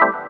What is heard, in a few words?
DR. LOOP; X-Static Goldmine 3; CHORDS